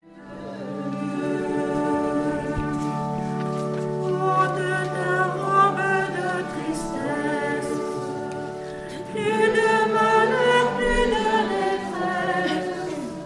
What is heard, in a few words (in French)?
chant entree